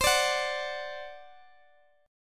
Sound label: C Suspended 2nd Flat 5th C Guitar Half Step Down